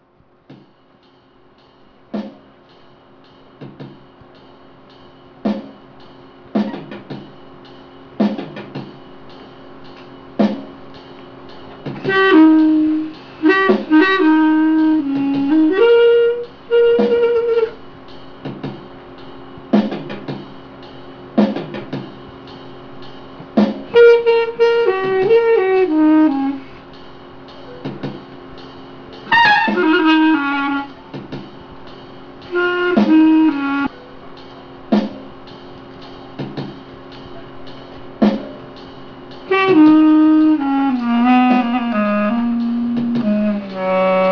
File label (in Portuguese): sax e acomp